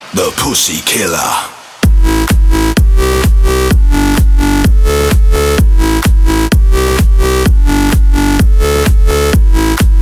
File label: win